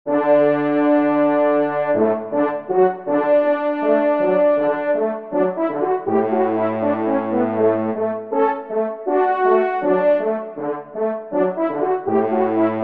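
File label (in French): duo, ensemble, trompe cors; Kling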